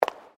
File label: FOOTSTEPS WOMENS HIGH HEELS CONCRETE STEP; Footstep Foley; Footsteps (Sound Effects:Human)